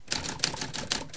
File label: type